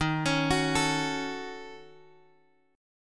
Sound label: Eb Minor 7th Flat 5th, Guitar Open G